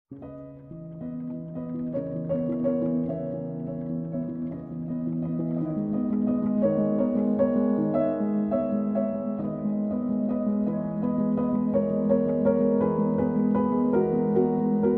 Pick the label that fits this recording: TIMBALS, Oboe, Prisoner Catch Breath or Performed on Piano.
Performed on Piano